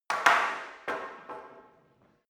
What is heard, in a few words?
staff wood drop